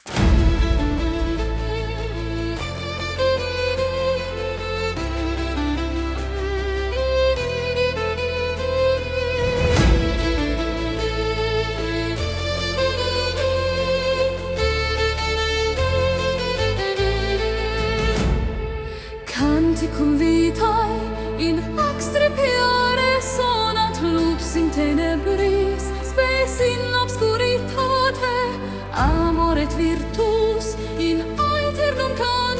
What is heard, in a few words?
Theme, Female Epic; Tales of Xdripia Theme; Short Version